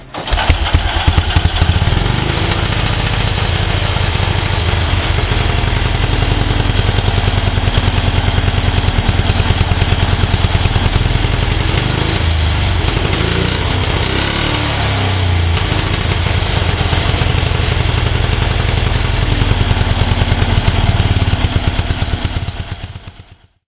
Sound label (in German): Motorrad AWO